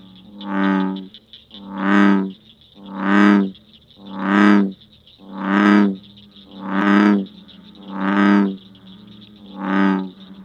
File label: bee